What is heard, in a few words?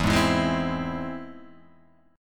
E Augmented, Guitar, Open G